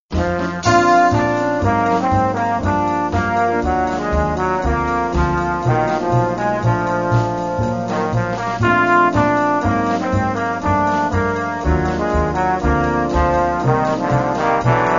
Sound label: contretemps, trombones